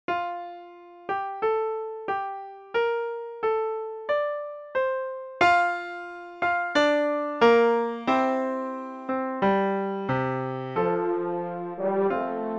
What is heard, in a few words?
hymn for french horn french horn and piano; emperors hymn